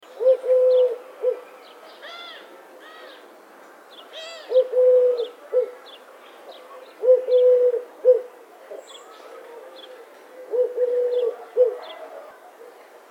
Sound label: dove sound eurasian collared dove